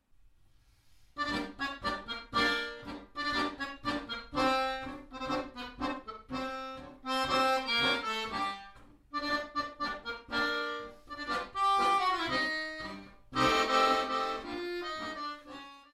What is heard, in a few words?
1812 Brilliant band